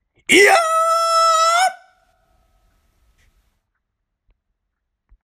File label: voice iyaaaaaa